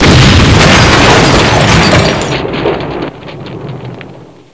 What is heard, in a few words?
explode; svencoop; factions